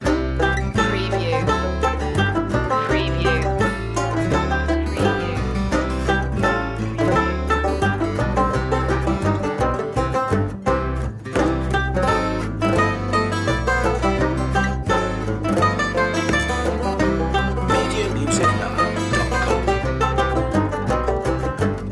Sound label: banjo rag loop